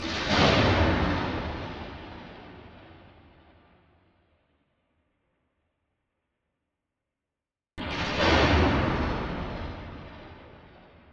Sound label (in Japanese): kaminari; musicbox